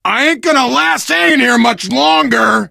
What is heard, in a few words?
ledge hang, biker; video game; source engine; left 4 dead 2; survivor; voice